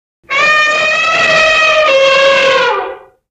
Elephant Single, elephants

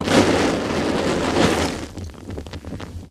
Benzine Flame Flare

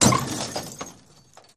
Auto Crash Med Head O, car crashes

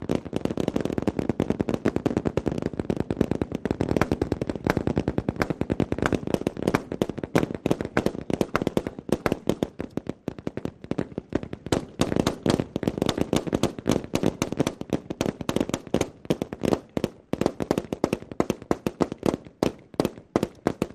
Multiple Weapons M16, m 60

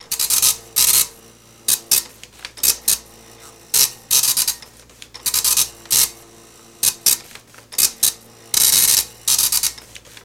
Dot Matrix Printer; printers

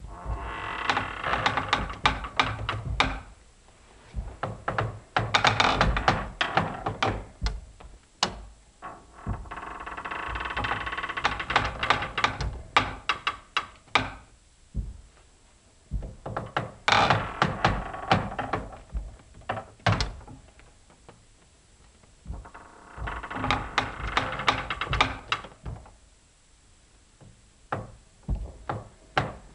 Boat Wood Creaks

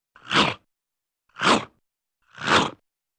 Funny Snap Growls, dog

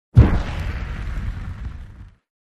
Fire Ignite Ignition

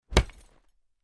Chain Metal Hits

